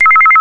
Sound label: Empty memory, Signalling Tones Elevator Phone